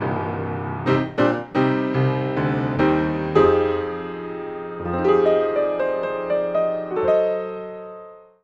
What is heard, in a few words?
Multimic Facets Piano XY Lo Strings